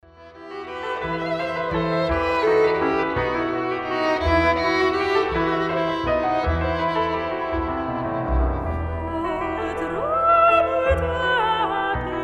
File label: Romantic Variation; 400 Year Concert